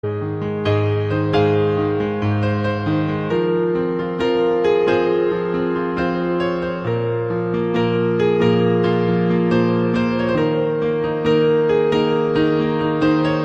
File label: Piano